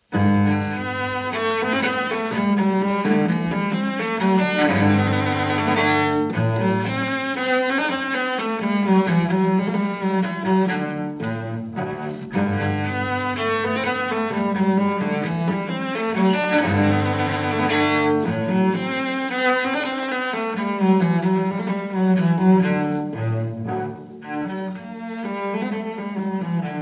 Suites for Solo Cello NO.1 in G Major V. Menuet I Live; Bach Suites for Solo Cello, Bvw 1007-1012 (Live)